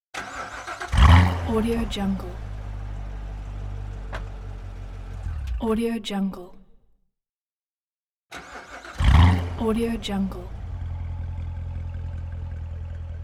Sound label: exotic car engine; moonaudioproduction